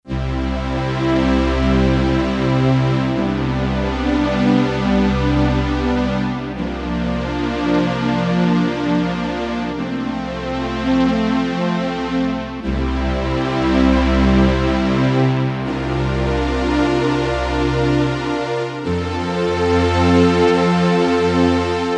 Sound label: Warm Pad Fade, Roland D-10; Factory Patches